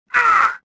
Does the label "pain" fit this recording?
Yes